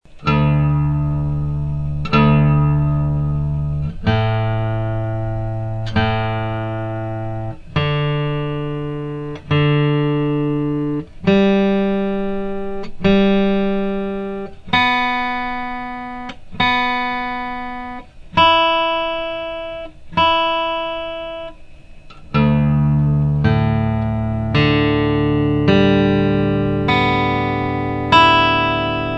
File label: tuning DADGBE, guitar